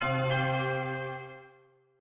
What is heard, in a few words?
quest complete; kult